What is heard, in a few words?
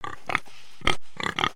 Pets Warthog Beg; creatures; Lego